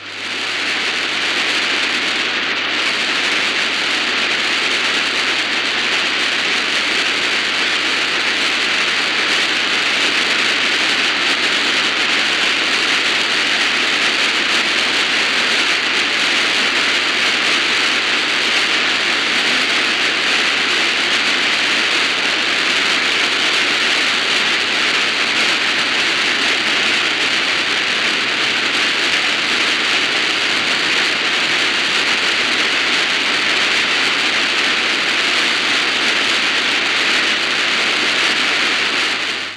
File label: HAARP 5.40 MHz, Anchorage Alaska; Ghosts in the Air Glow; Kiwi sdr WL7NO